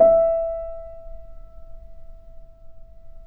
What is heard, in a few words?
PP E 6, Grand Piano off mic; AKAI S6000 CD-ROM - Volume 2